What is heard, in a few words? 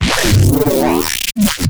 glitch stab; Virtual Riot; Glitch Stabs